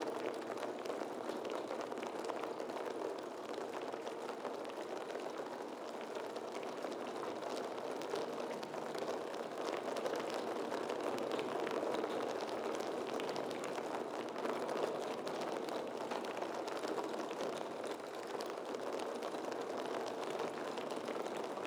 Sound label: ambience rain roof wood; Rust